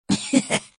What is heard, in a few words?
laugh short; Adachi